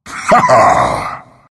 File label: laugh; doom bringer